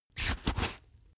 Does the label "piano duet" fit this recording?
No